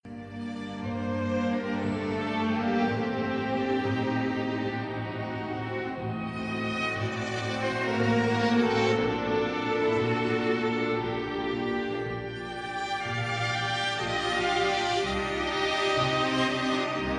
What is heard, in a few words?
Jingle